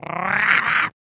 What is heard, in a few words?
Goblin attack quick